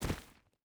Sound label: footstep barefoot stones jump land; Rust